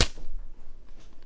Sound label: hi stick ish, Cigarette Lighter Kit